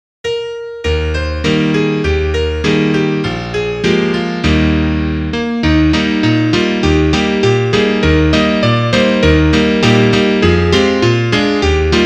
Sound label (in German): Klavier Solo, trompete; Etüde; Etüde Seite 53